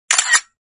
am thanh chup anh

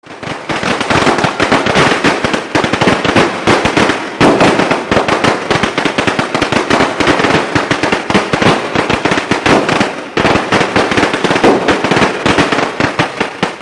nhạc chuông tiếng pháo nổ

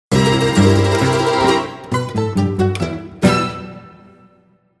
nhac nen ket thuc chuong tinh het phim hai huoc

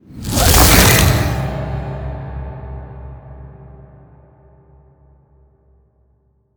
hieu ung am thanh kinh di kim loai

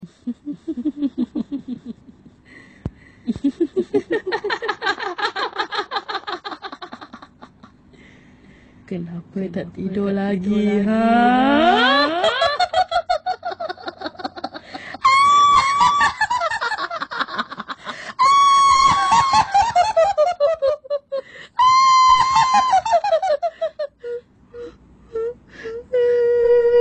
tieng ma cuoi

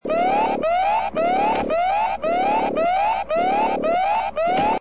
tieng coi canh bao phong khong phat ra khan cap

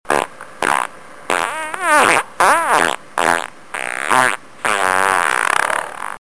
tieng danh ram

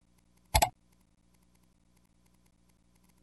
âm thanh tiếng click chuột

